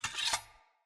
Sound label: Short Sword Unequip; Weapons; Player